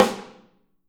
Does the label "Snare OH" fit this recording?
Yes